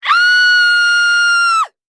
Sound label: Horror Scary Human Female Screaming